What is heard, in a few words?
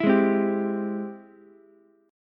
G sharp Augmented Major 7th, Ukulele; strummed; JGuitar